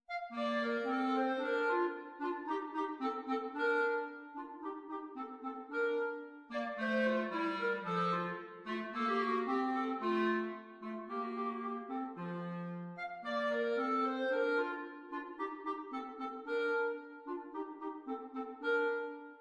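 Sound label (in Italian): Allegro in Sib maggiore, clarinetti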